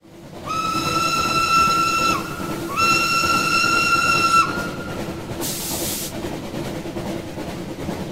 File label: train; transportation